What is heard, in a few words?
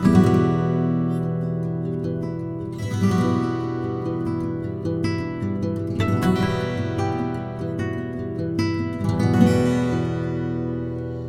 Camp Guitar D